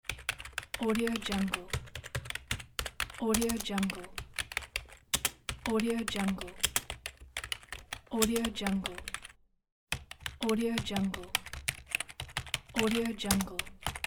keyboard typing; keyboard typing pack

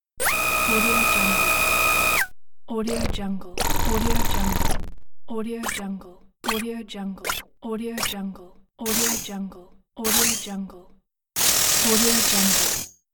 futuristic power tools; efxideas

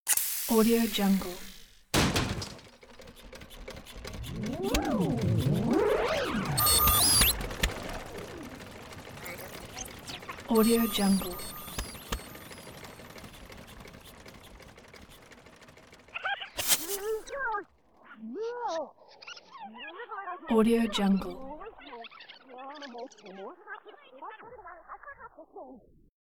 robot goes crazy

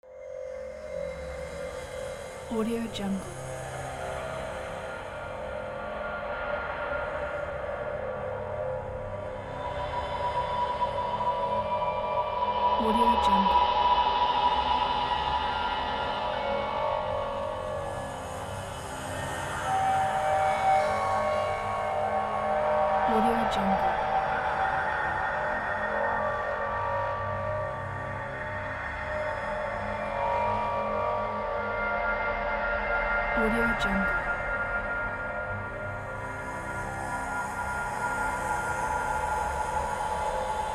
dark tunnel ambience

